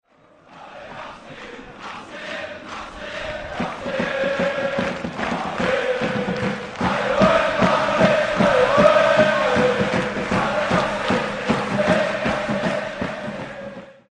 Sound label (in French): sonnerie om